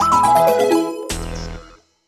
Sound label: Breakout Mini-Game (Win)